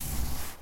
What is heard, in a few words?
digging